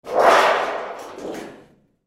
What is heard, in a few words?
Metal Sheet Up, Metal Sheet Pick Up Movement; Foley; Metal Sound Effects; Materials; Blastwave